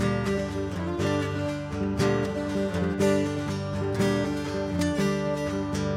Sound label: Strum